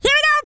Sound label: Vocal, R&B & Dance; ILIO; Vocal Planet VOL-4